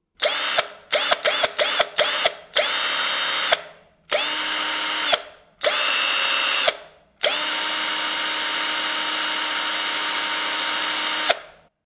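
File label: sound of screwdriver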